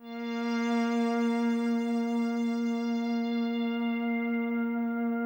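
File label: strings, Hip Hop and R&B Drumloops; Best Service ProSamples vol.01; AKAI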